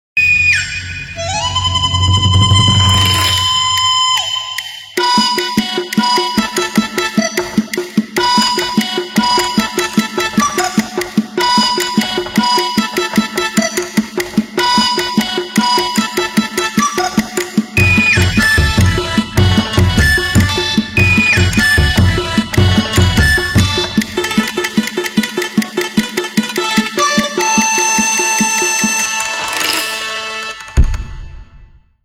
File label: Summer beats; Nokia; 808 PureView China